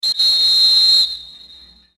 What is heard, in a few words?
whistle; csgo